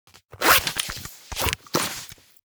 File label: zippers and packing